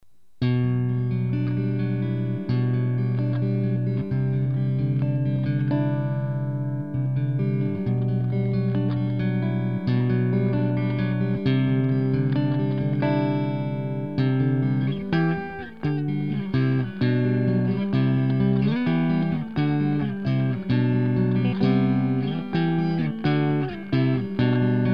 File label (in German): clean, gitarre